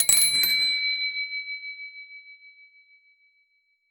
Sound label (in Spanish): efecto de sonido de un, hotel